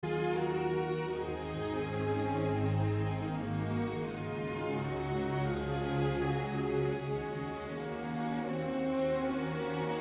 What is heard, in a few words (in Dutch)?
Verdrietige muziek; whizz-art